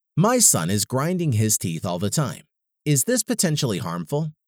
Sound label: grinding his teeth